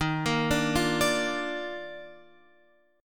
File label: D sharp Major 7th Suspended 2nd D sharp, chord